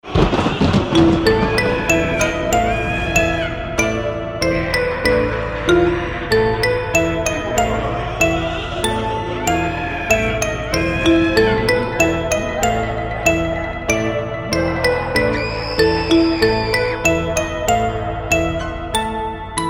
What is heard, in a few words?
Halloween Marimba Ringtones Remix